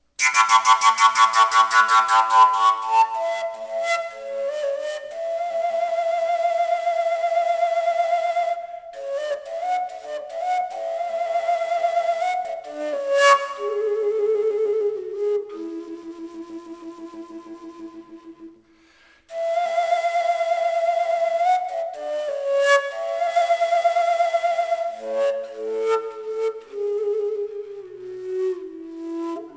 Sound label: double fujara a; folkart slovakia